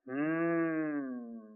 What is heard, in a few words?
Hmmmm